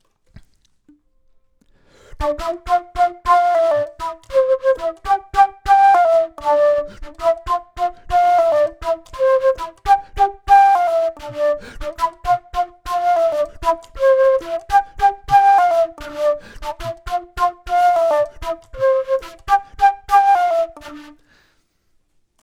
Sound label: flute; logic